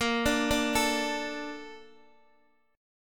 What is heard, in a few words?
A sharp Major 7th, Guitar Open D